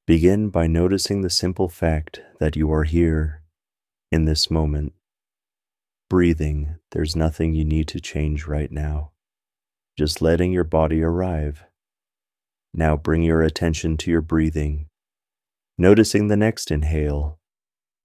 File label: breathing